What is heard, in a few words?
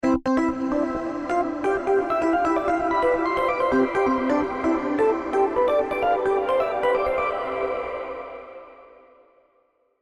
riff with fx